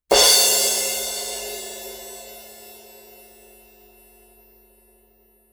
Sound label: thin crash 16 hit, cymbal; sabian; b8pro